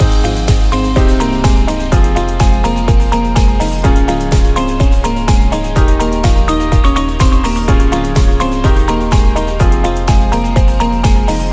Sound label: Promo Loop